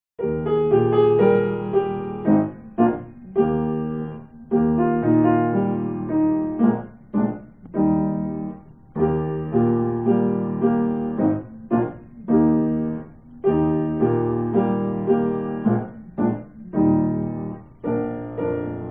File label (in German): fröhliche weihnacht, trompete